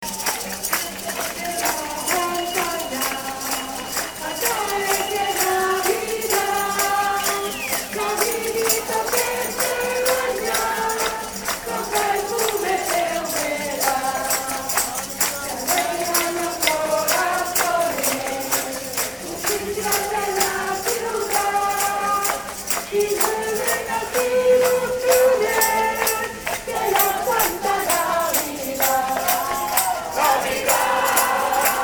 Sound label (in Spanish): navidad